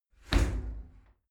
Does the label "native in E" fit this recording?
No